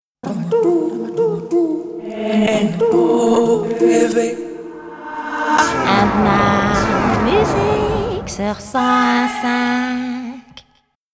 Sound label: Radio Nova Jingle; Nova 25 ans - 1995